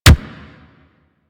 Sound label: Pulse Laser Punch; Railjack